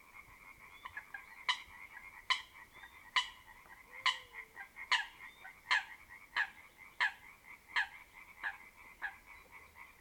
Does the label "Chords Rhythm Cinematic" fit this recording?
No